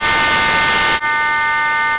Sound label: noise Mask